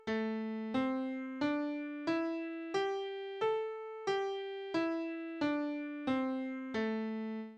pentatonique Mineure

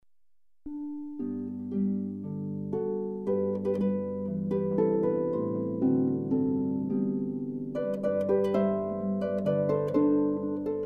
harpe

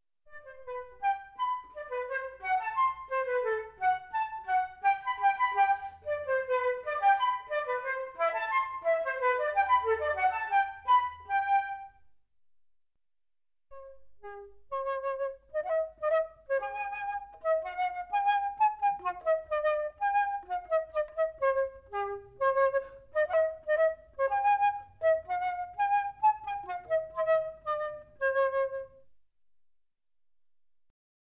flute traversiere

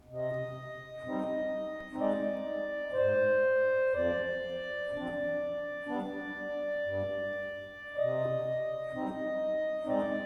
harmonium

